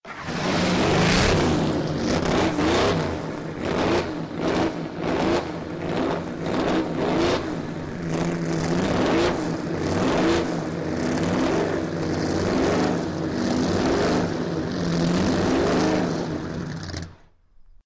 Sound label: ferrari f125